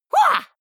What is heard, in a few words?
goblin fight shout attack; soundpool